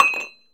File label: Coffee Cup Drop; SFX Kit; Foley - Misc